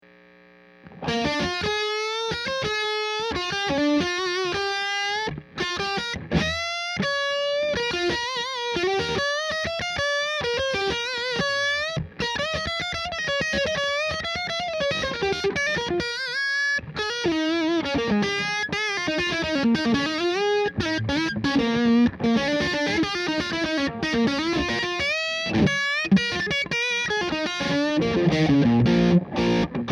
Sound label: h55 65w 100w marshall p90